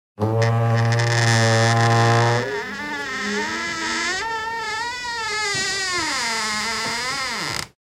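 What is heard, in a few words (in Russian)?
скрип огромных ворот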